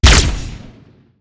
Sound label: fire, weapons; ppistol